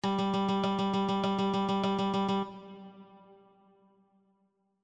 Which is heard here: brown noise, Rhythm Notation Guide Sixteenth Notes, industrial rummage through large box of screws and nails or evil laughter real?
Rhythm Notation Guide Sixteenth Notes